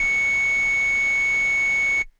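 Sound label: Air Bass Kawaii C#1; keys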